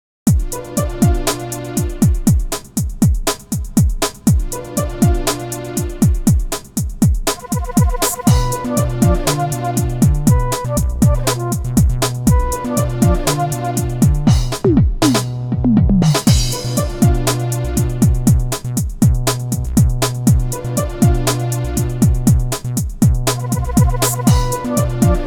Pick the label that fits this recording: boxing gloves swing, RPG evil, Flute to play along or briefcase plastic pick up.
RPG evil